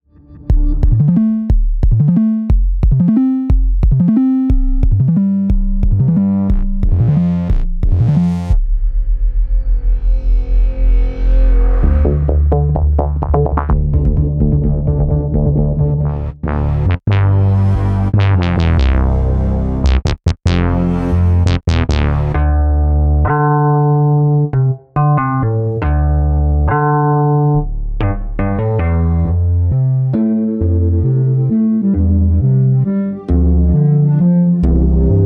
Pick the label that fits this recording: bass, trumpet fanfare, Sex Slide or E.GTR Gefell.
bass